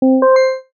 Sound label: surprise pluck up